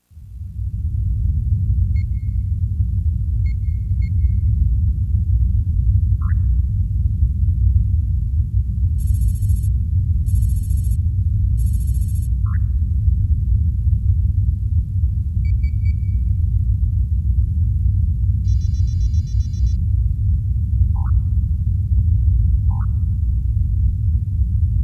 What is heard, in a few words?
Ambience; starfox; Great Fox